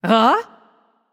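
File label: surprised, female voice; smite; voicepack; hera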